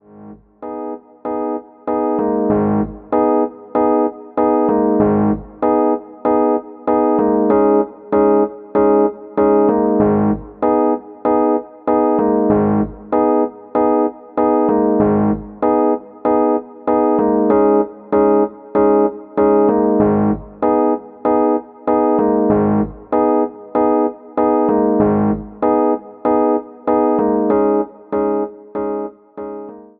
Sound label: Rhodes chord, hip hop chords; rhodes chord 20